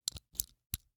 Rachet, vanadium steel, 8in, standard, locking, clicking, mechanical, new, socket release, fumble; Eiravaein Works; Vaeyan IV